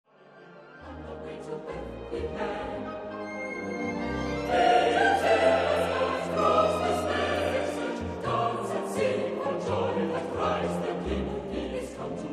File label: Shepherds pipe carol